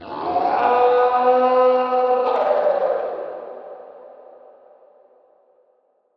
yell

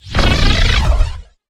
bullet heavy needler; ufoai; weapons

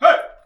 cyclops yell

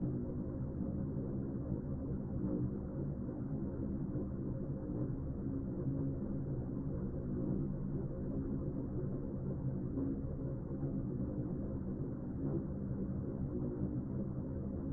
alien generator

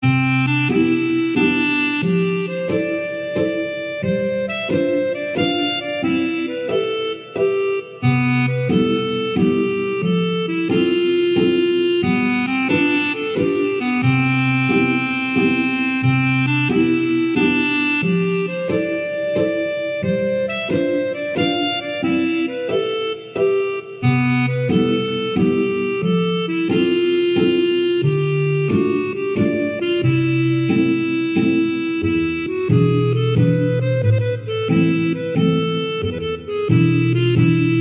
town; stendhal

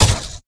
laser body impact

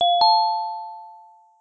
notify up

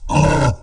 hurt, aliens; ortnok

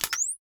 ir goggles; ufoai; weapons

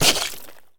knife body impact

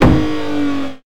build place; geoscape

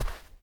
sand, footsteps

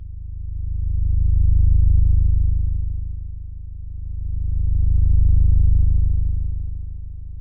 hum

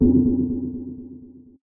info, geoscape

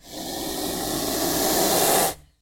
snake cobra

